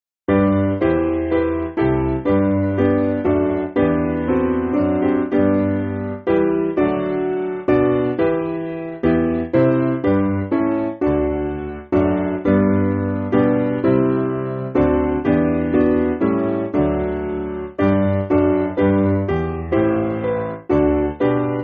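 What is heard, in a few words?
Piano, Music Backing Files for Churches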